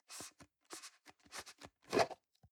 plastic can small lid screw open soft

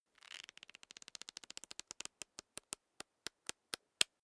small book riffle through slow

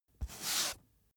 book dust off staged single

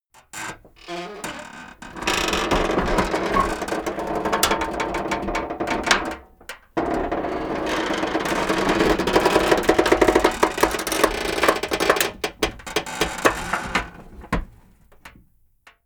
metal creak stress medium

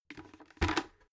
bucket lift up put down soft

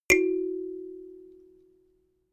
notification kalimba second

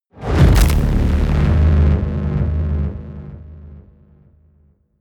promo pad

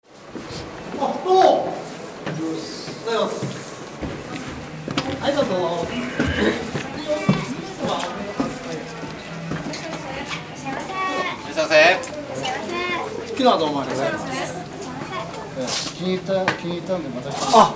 entering a restaurant japan

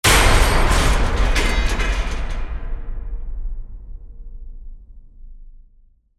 metal door impact

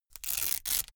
velcro large open

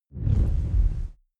noise buzz

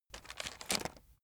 chocolates take

